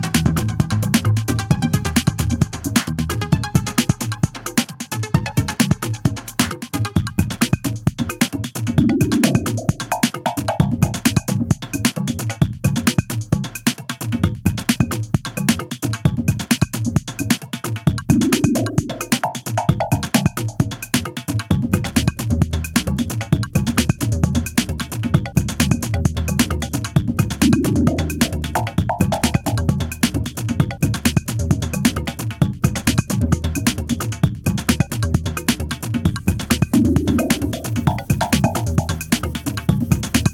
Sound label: Cruel Trance